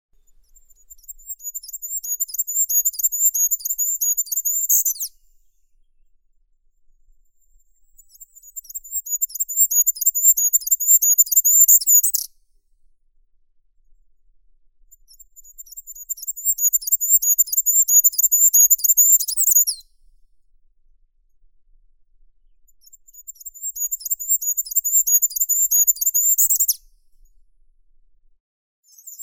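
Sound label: goldcrest, regulus regulus; korolek zheltogolovii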